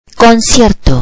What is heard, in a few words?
concert